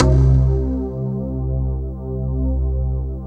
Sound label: SYN K-2000, Roland